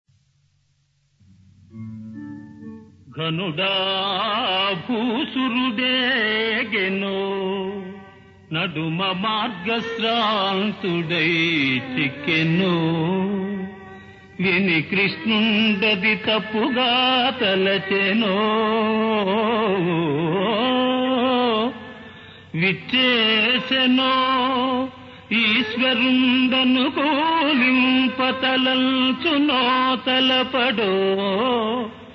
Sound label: Padyam